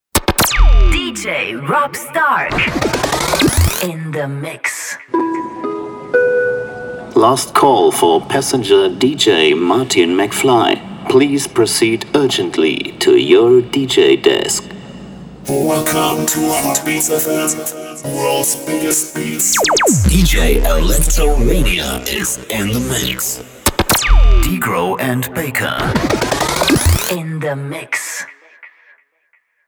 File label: DJ Jingle